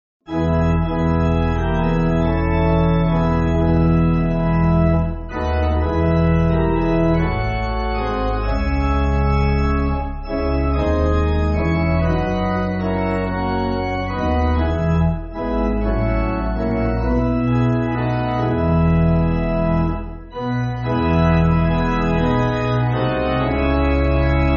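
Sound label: Pipe Organ, Backing Files for Churches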